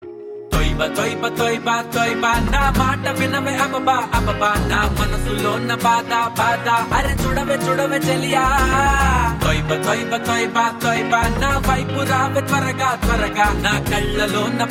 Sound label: Toyiba male